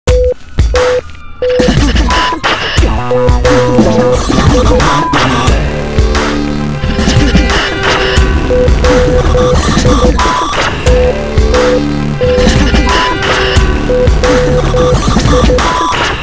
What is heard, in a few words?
spaceship